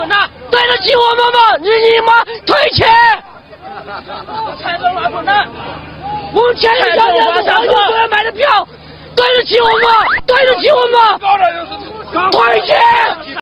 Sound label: chinese soccer fan